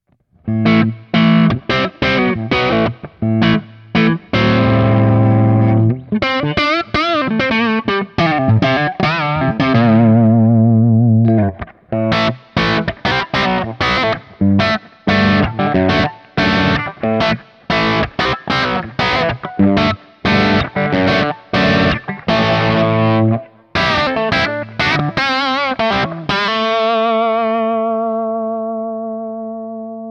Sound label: Hagstrom Ultra Max Crunch Fingerstyle Neck Bridge